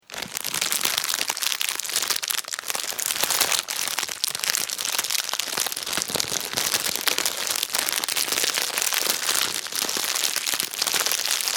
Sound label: Sound of crinkly food wrapper being taken off; Crackling; Objects